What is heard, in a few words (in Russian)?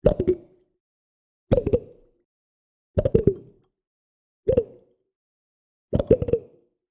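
эффект мультяшный пузырь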